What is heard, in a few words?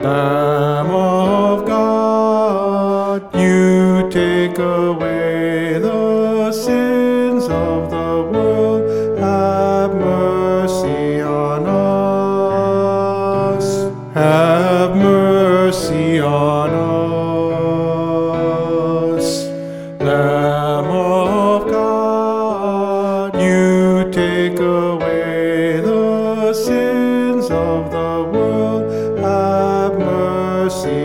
BASS